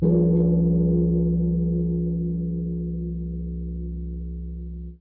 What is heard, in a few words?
Emille Bell